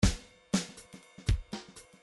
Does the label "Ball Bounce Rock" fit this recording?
No